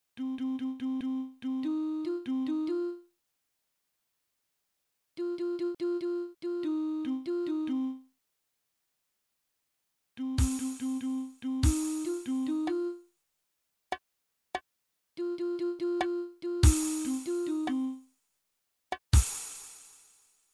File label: riff from melody